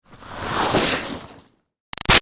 Skiing By Fast Snow Scrape; Skiing and Snowboarding; BigRoomSound

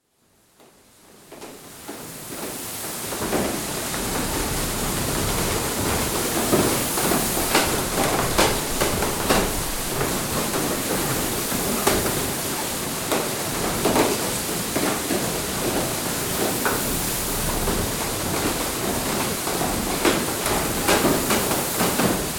Water Mill, interior, mill operating; Machinery; BBC

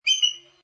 American Bald Eagle Peeps Twice, Bird of prey; Lynne Music

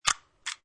Lock

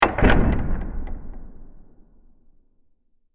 Heavy Hit With Delays; Miscellaneous Impact; Impacts; Crafted Signals

